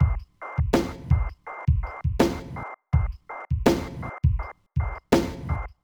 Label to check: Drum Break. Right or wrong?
Right